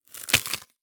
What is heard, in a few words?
Horror Scary Bone Break In Half